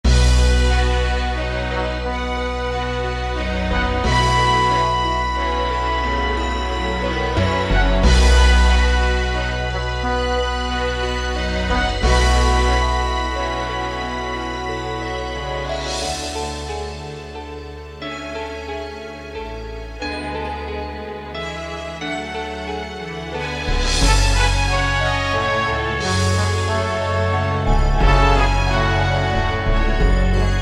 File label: Whale Swim